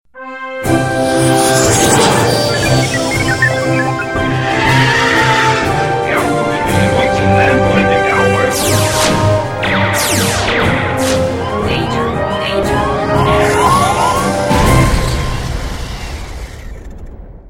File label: 3d Space Effects